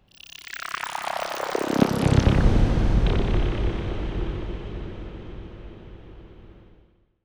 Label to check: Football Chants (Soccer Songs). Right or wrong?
Wrong